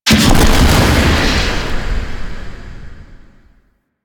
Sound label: Void Dragon Firebreath Cast Ice; Enemies; Abilities; Warframe; Duviri